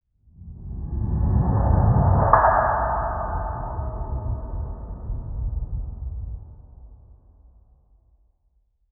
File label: a very large and beautifu, building